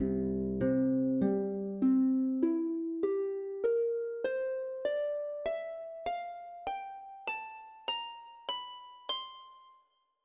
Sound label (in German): obertonreihe